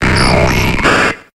Grito de Haunter

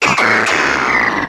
Grito de Passimian

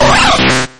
Grito de Kabutops

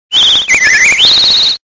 Grito de Rookidee

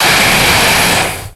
Grito de Kyurem

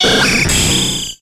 Grito de Skarmory

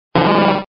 Grito de Grimer

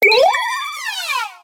Grito de Lampent

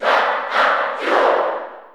Grito del público; Duck Hunt; Ingles PAL; SSBU

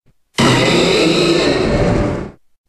Grito de Mega-Blaziken

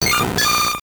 Grito de Blissey

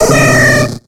Grito de Teddiursa

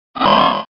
Grito de Duskull

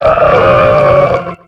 Grito de Wailmer

